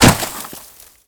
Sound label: land snow, footsteps